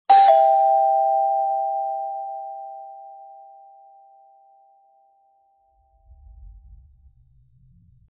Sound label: doorbell sounds; mini ring